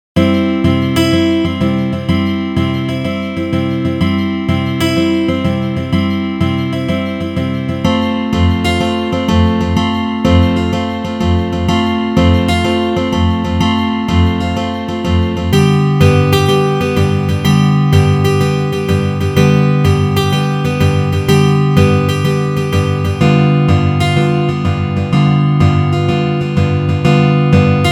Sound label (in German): Akkorde C F G G7 C